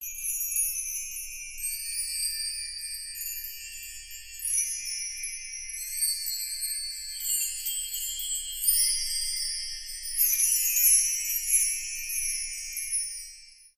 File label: shine